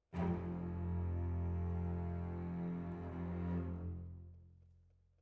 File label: Violas, jazz for piano